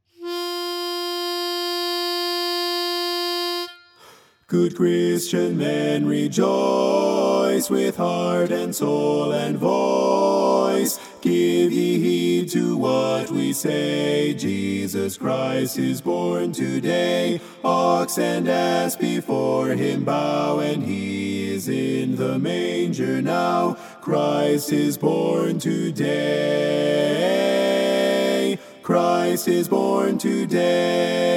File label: BASS, Good Christian Men, Rejoice